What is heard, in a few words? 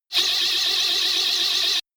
shot buzzing wavy tooth; zaps buzzes